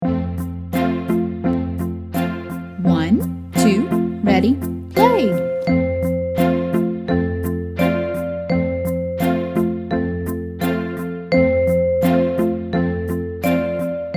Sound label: Its Raining Its Pouring SLOW Tempo WITH INTRO, ukulele; Rainbow Ukulele Play-Along